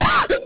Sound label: eject